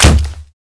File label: crossbow; garrysmod